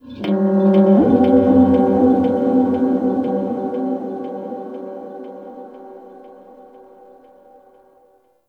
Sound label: Texture Distant, Ambience; Warframe; Cephalon Weave